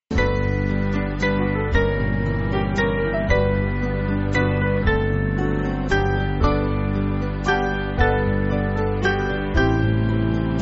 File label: Band; Earthly Pleasures; Spring Hill; Ackley